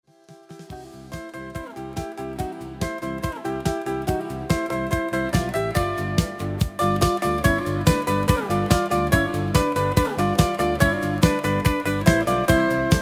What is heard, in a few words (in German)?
Musikbox Schlager, Musikstück, zu welchem man tanzen kann; Musikstück, zu welchem man tanzen kann, aber auch für Karaoke oder einfach nur zuhören; Musicbox Schlager